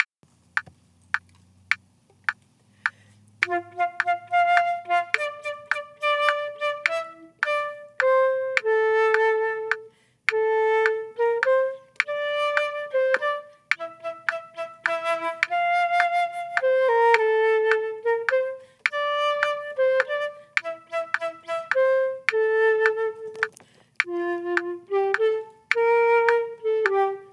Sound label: trio w percussion; open the door for me; toe excellence in chamber music bk 1